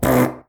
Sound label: fart classic parp